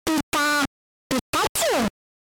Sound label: Pikachu SFX; RBY